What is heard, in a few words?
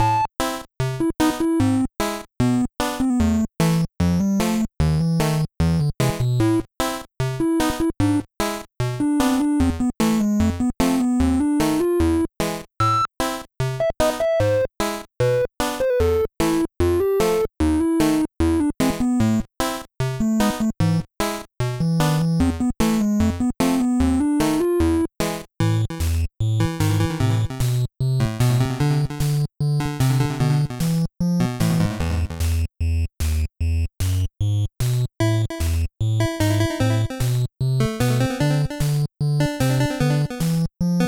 8-bit Haunted House Theme, Spooky